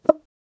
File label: single bunny hop